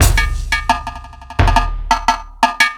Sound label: Mixed Percussion, percussion loops; Drum n Bass; KJ Sawka; Drum n Bass & Beyond; Drum Tool Box